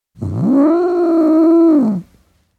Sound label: Tom cat growling, individual growls; Cat Sound Effects; Cats (Sound Effects:Animals); BBC